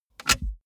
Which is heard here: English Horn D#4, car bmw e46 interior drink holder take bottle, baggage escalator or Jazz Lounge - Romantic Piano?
car bmw e46 interior drink holder take bottle